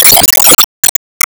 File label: Normal Shot Gold; Se Normal Shot Gold